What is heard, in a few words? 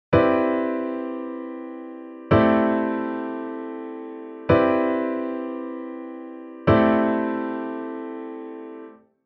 arpeggio